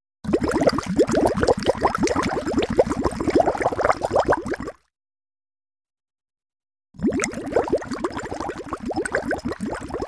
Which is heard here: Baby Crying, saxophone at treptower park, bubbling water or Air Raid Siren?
bubbling water